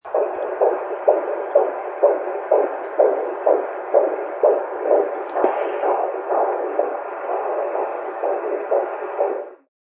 Pre Natal Heartbeat, Heartbeat in womb heard through monitor.; Human; Heartbeats